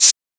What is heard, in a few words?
Shaker; FLPRO